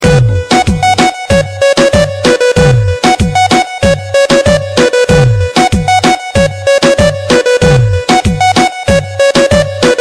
Full Bass Disco

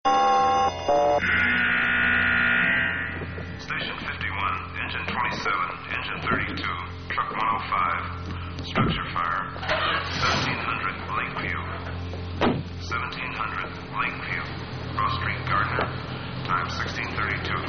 Emergency

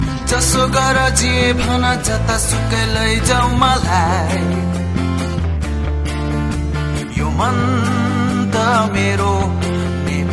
Nepali

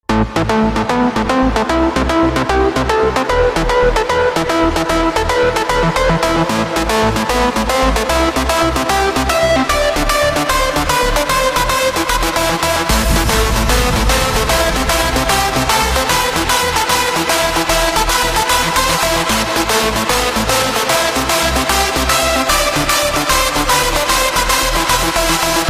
Hardstyle

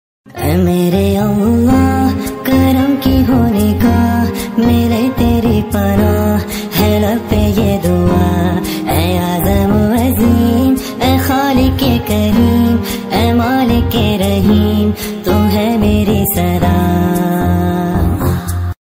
Islamic ringtone

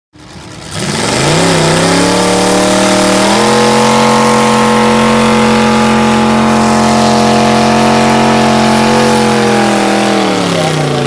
Fiat 600 406cid

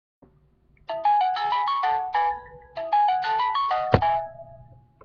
Alarm Tone

